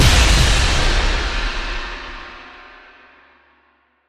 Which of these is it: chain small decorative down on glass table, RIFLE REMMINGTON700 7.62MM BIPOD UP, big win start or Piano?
big win start